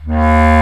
WND CB Clarinet; Roland LCDP04 Orchestral Winds; Lo Clarinets

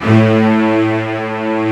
STRING SECTION A1; STRING SECTION #2

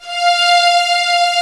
ORCH F4; STR

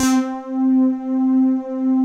BRIGHT POLY; Pro-Rec - Synthsonic; TRINITY

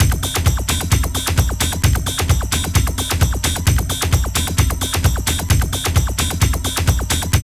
LOOP, Tekno Industrial; East West

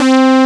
SAW,sound canvas

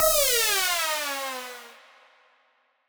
Siren Horn down, Ramp Down, A; future rave

